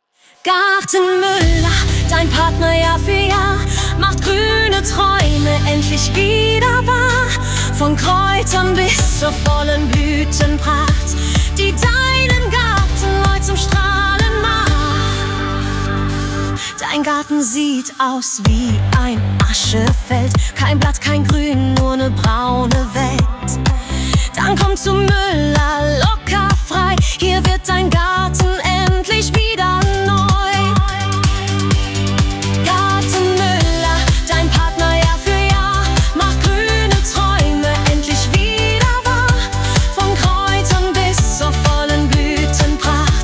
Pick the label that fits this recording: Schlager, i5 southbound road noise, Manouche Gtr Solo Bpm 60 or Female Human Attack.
Schlager